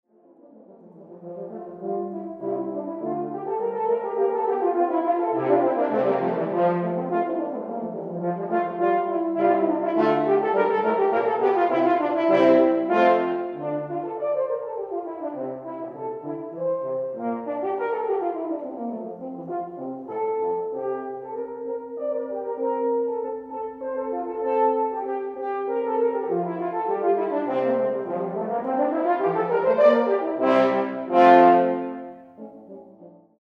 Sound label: Skaffare Polska